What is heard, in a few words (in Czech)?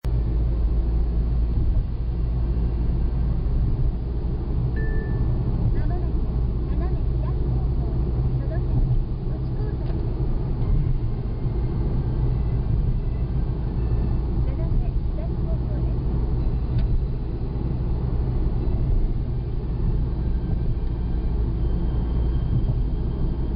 dalnice v noci; japan